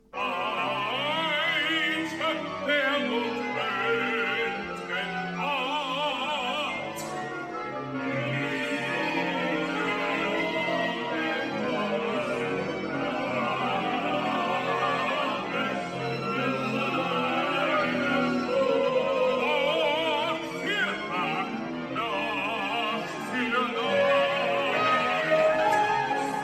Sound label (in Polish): soprany; cardillac